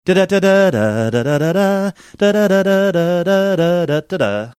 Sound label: singing; sparkler song his mom sings